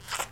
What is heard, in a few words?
worm; blockly-games; bird